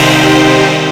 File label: STACK, Big Beat; AKAI; STACKS KIT